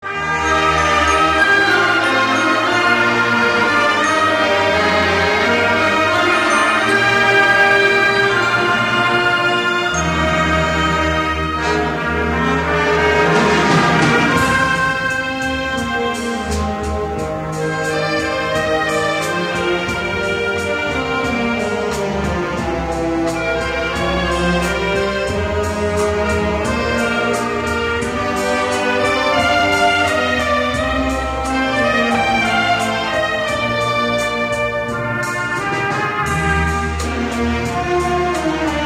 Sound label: instrumental